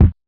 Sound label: Kit Kick, Realistic Concertmate-670; One Shot Kit